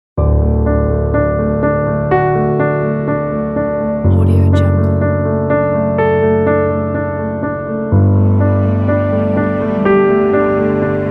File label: uplifting emotional piano